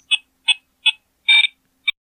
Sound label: Wiimote Sound; Mr. Game & Watch